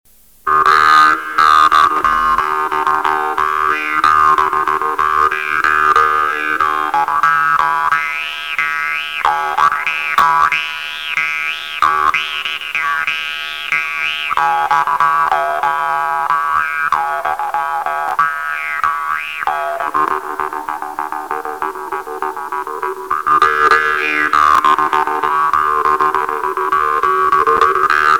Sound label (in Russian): vargan; Tuva Mongolia; yeroshev; ерошев